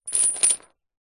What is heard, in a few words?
industrial chain med sized set down on soft surface; zapsplat